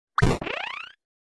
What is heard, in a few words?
door open